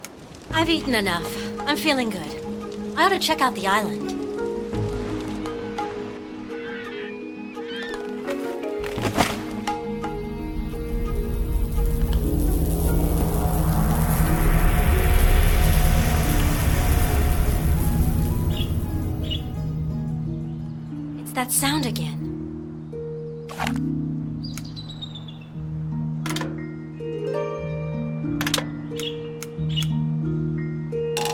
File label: switch from happy to scarry; return to mysterious island